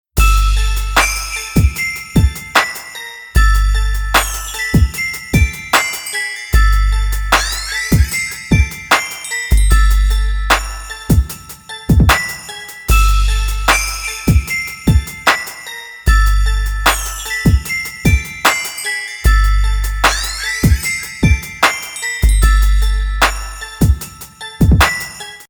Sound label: Hip Hop Christmas